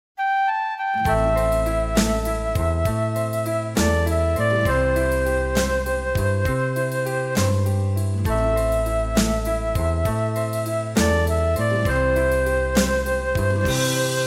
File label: Childrens Choir